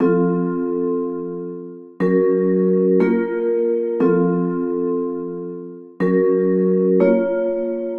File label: Bell Hip Hop Dark F 120, keyboards; Sample Banks; Melody